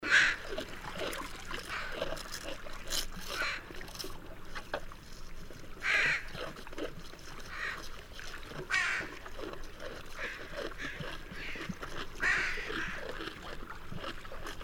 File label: brown bear eating barnacles